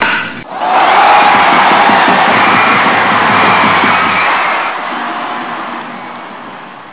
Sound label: hit crowd cheer